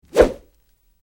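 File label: Medium Speed Air Punch, whooshes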